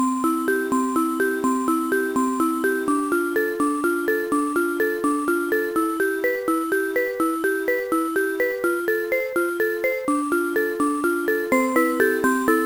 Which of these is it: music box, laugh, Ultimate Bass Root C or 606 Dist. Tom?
music box